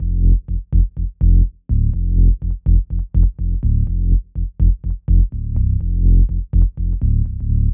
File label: Bass Loop Fm 124